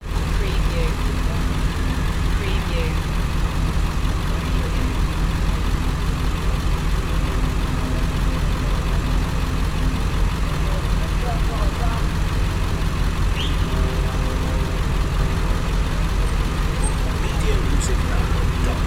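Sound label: Bus Engine Idle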